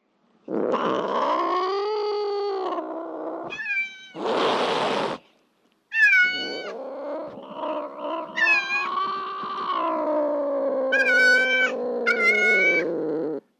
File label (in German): Fauchen Knurren Miauen, Katzenschnurren